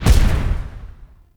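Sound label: Exalted Archwing Scythe Projectile Die; Weapons; Melee; Warframe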